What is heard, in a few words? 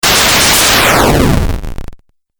Fireball Video Game